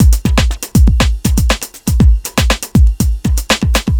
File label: 120 beat full, Nu Jazz House; PowerFX